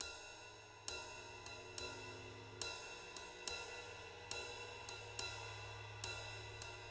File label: JZ70 SWING, Living Drums